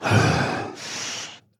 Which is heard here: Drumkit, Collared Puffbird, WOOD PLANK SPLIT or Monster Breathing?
Monster Breathing